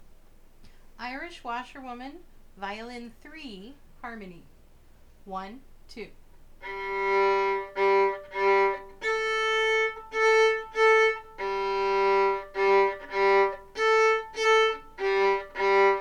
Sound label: violin harmony; Irish Washerwoman